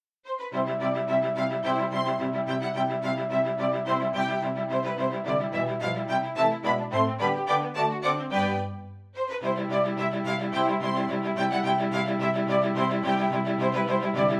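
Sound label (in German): Contretanze fur zwei Violinen und Bass; Zwolf Contretanze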